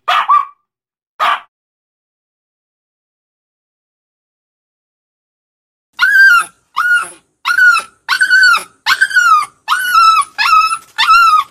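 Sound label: barking sounds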